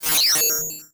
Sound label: Science Fiction Sci-Fi Electronic Computer Screen